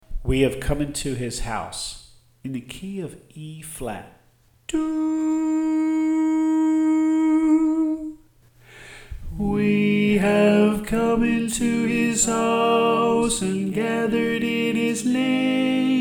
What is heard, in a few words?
Tenor